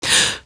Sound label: gasp; nova prospekt